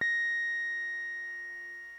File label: bbm, Omnichord; OmnichordGodot